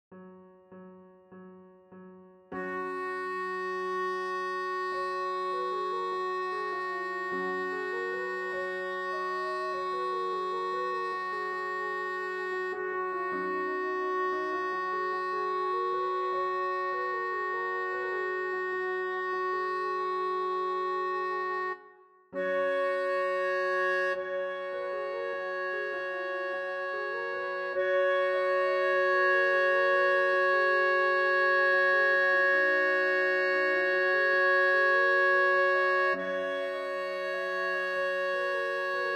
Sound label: ALTO; Prelude